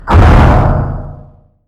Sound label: giant robot attacking